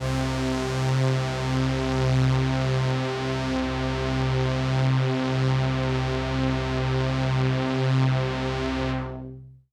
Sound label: Pad C, Synth Prophet One Shots; Electronisounds